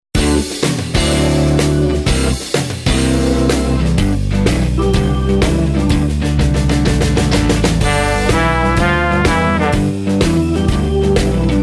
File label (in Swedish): Blåsarns blues, Blåsarlåtar